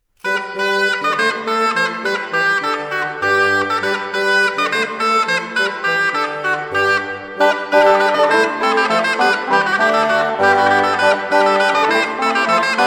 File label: Rondo